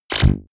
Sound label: sci-fi plasma cannon fire deep space